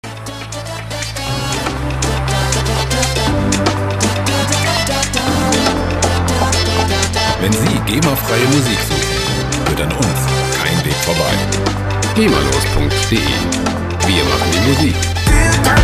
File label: beat drop road